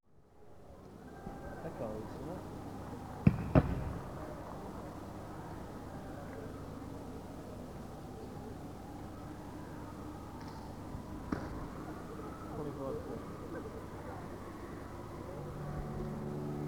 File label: Tuning & Soundcheck